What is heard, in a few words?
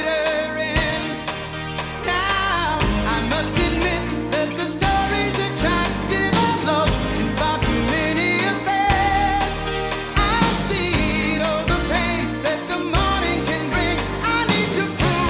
rock